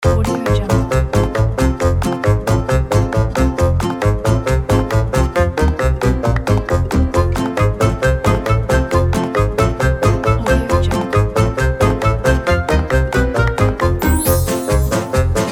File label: funny comedy background; pianissimo composer